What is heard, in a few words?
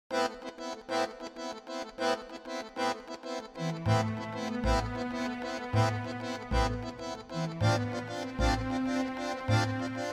bandoneon concerto, bandoneon, string orchestra; ORCHESTRAL MUSIC